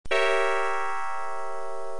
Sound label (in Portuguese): acorde setima diminuta; musica classica